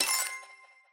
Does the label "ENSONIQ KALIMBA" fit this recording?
No